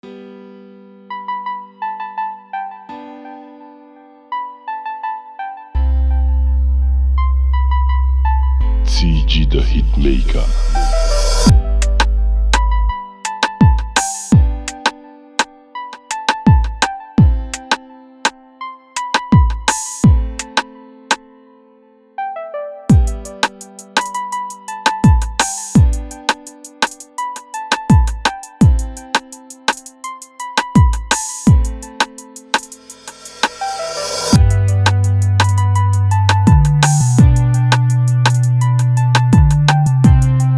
Gospel Free Beat

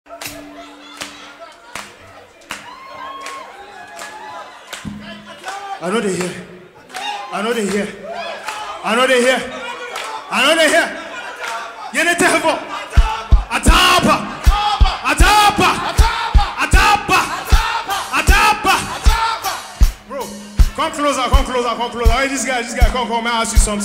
Clap Break Live

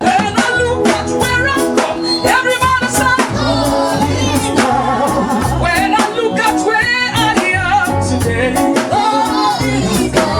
Live Reggae Music

